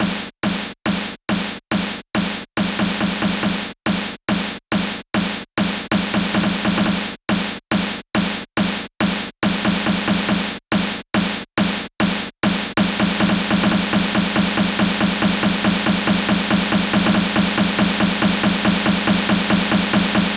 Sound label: 140BPM SNARE 16 Bars; Vengeance Samples; Snares&FX Pairs